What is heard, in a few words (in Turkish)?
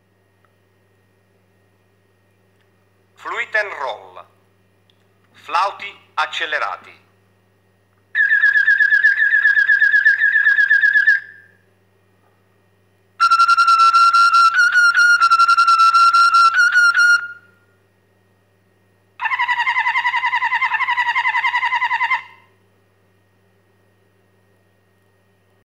Fluitenroll, Malinua Kanaryası, Fluitenroll, ıslık benzeri ötüşlerin makaralı bir şekilde birleştiği karma bir tondur; Yüksek performanslı kuşların repertuarında yer alır